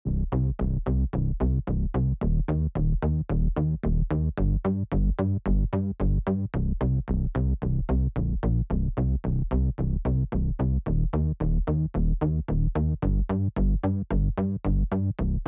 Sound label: Dark Disco Bass; retro 80s synth wave; retro 80s synth wave presets abx3 vol1